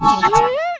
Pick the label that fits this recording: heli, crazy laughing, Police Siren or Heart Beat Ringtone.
heli